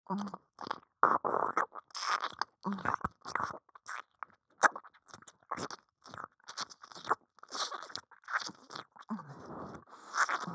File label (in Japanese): 耳舐め 弱い 右耳; アイリス